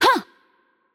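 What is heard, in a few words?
Attack Light, Korean; bloom minigame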